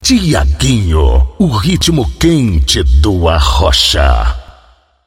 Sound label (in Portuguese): vinheta